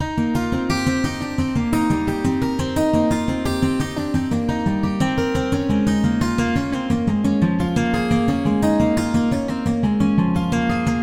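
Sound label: poly guitar minor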